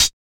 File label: Closed Hats